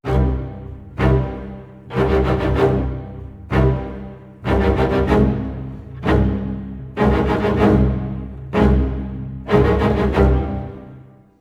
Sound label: 95 c min strings lo, Orchestral arrangements